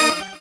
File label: normal hit normal